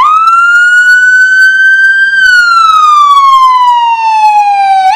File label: wail new, sirens, whelen gamma